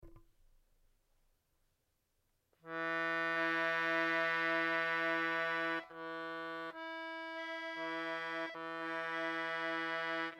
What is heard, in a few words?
octaves; timbre comparison